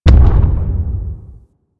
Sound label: Distant Step; Enemies; Steps; Warframe; Corpus; Arachnoid Camper